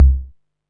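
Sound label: MISC Left, Techno 138 BPM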